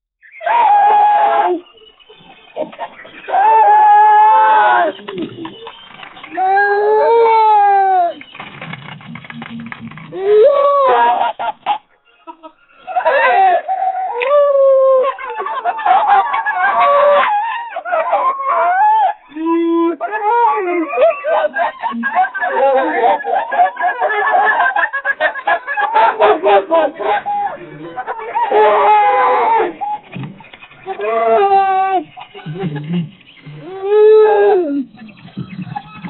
two nigerians, laughter

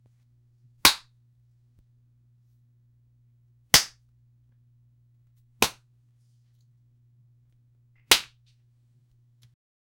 slapping a face, actions

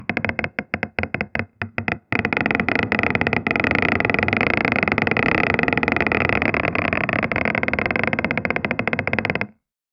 eerie organic grinding, biomechanical

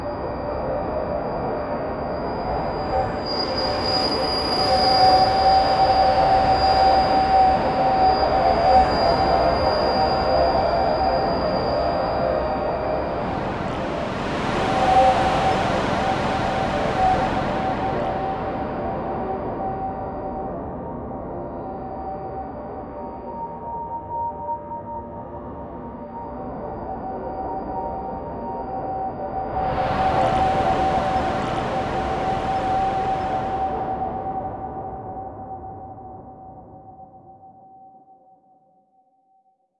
dark vortex grrrum light, chimes

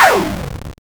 weapon shoot 8-bit

